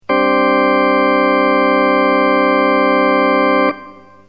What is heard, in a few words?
organ dry; jurgen Haible; synth